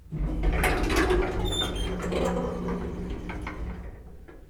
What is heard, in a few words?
elevator open